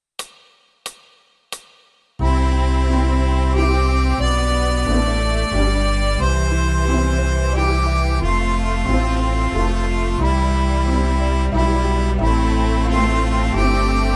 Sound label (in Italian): Fisa tutti